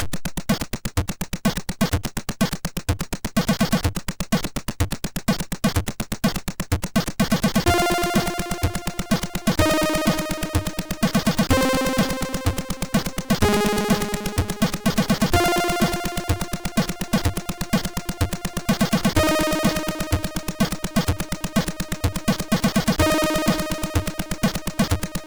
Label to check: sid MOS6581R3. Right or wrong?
Right